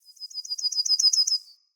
Bird Song; Galene Bird Guide

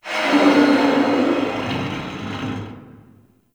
Arcade Dino Laser Roar; Warframe; Ambience; Nineteen Ninety Nine

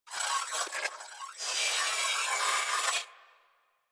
Venus Corpus Data, Ambience; Warframe; Venus Open World; Venus Landscape

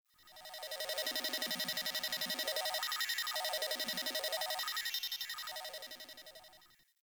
Computer Room Ambient Beeping; Warframe; Nineteen Ninety Nine

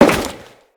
Crewman Step Default, Footsteps; Corpus

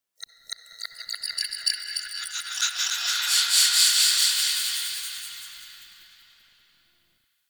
Sentient Texture Small G; Warframe; Sentient Lair

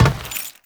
Step Metal; Footsteps; Warframe; Teshin

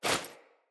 Foley Action Default Punch, Default Clothing; Player

